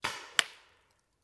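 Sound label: Paintball Gun Impact; Sound Ideas; Guns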